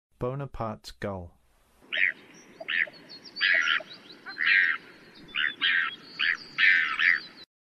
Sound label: Bonapartes Gull, Songs of Birds of North America; Western USA; Western USA Songbirds